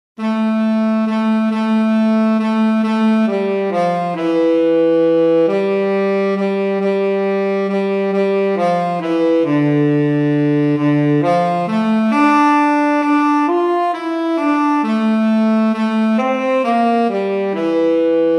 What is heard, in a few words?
tenor saxophone; tumbalalaika